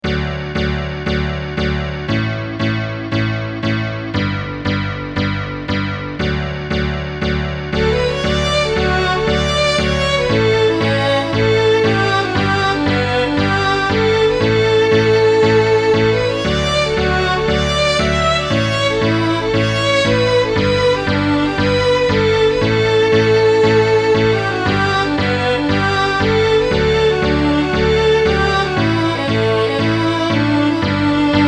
Next Rounds on Me Boys with pickup

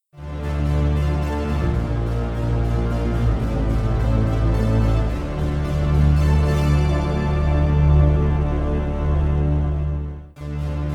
Backing Track D minor with lower 8va; Scarborough Fair

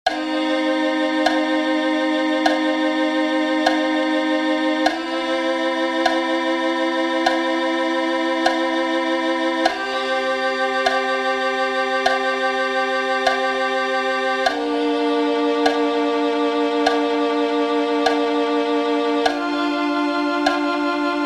practice track 50BPM, viola

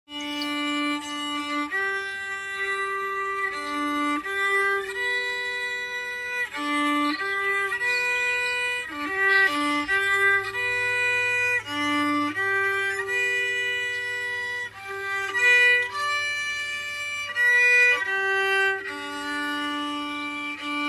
Taps Fiddle

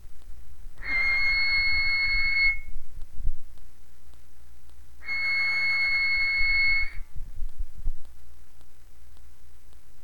bowing on the afterlength of the D string

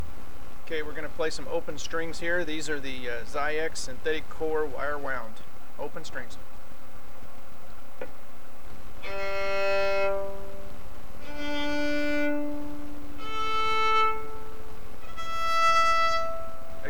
open strings new synthetic core

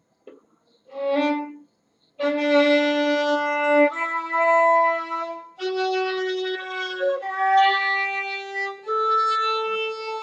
D major on G String 3rd position

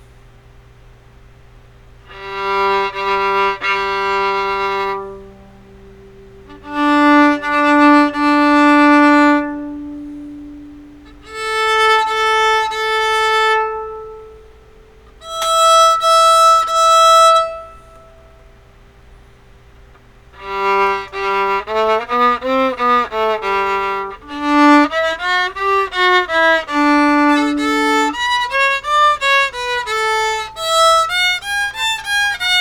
MV300 after bridge adjustment

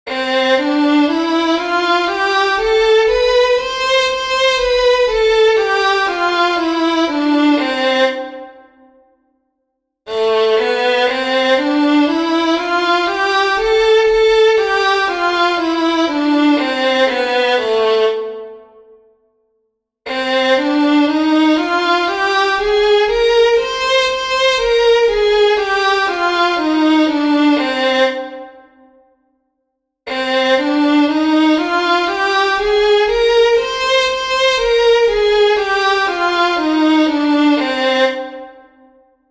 Natural and Relative Minor of C major

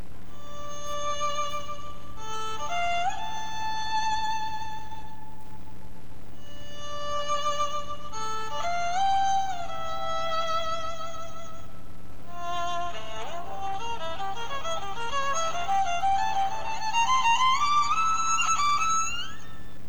ringtone violin

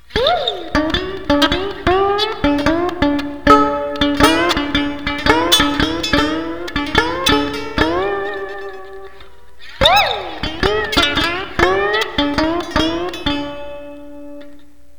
Violin being played with a bottleneck slide

